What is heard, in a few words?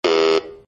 incorrect